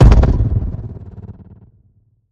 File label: Bizarre Wobble Hit